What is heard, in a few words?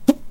fart